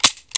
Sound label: launch deny